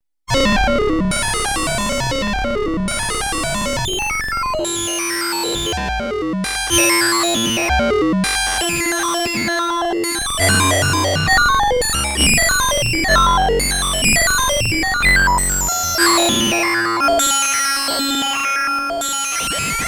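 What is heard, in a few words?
metal, Compact analog monophonic synthesizer; metal noise; MFB nanozwerg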